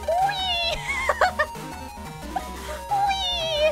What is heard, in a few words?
"Wheeeee"; noises